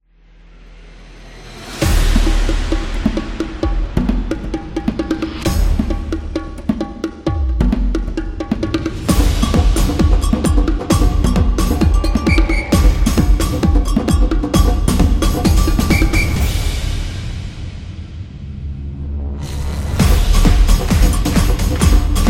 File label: Sport Action